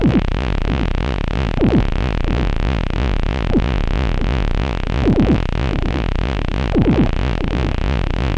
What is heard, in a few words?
monodelay fm 140, Loudest frequency 424 Hz Recorded with monotron delay and monotron - analogue ribbo; instruments; loops